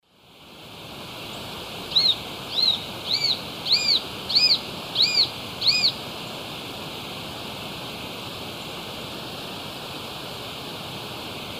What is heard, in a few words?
Buteo magnirostris, begging calls; S. America; Eastern Lowlands